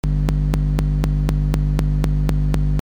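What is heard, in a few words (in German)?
mikrowelle loop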